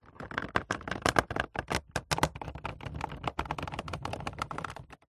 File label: foley cardboard box pressure creak seam taped join